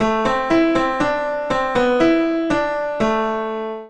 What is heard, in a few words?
short melody